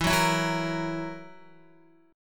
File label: E Suspended 2nd Flat 5th, Guitar; x,7,6,9,6,6; 4F,4A,5C,5G,5C,6E; strummed; JGuitar